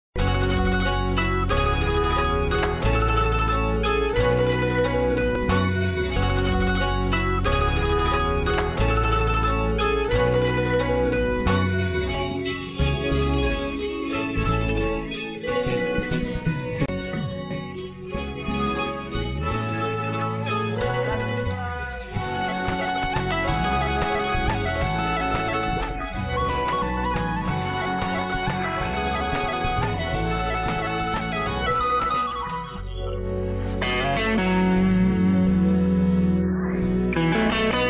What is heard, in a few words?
agnyaathavaasi love bgm full without vocals; anu emmanuel; pawan kalyan; keerthi suresh; trivikram